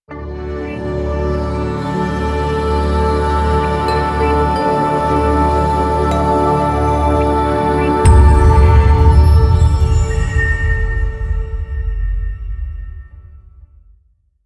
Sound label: logo elegance